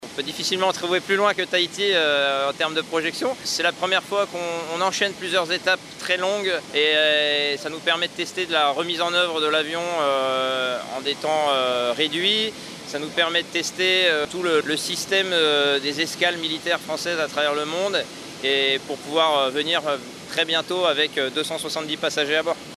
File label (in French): AVION; armee de l'air; fenua